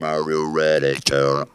READY GIRL, Funky Vocals; USB Soundscan vol.18; AKAI

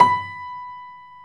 PIA GRS, PIA_GRAND, Roland

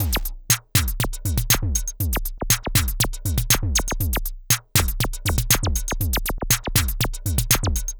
Percussive

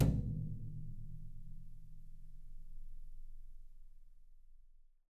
YAM18 BR, brush drums; Big Fish Audio; Ross Garfield Drums VOL-2; T-YAM BRUSH

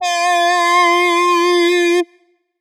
lead whims F; leads

